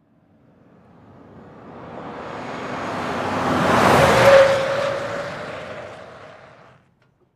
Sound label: Expedition Fast Corn, ford expedition